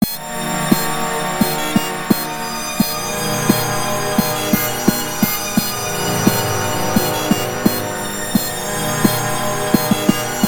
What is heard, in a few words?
singy synth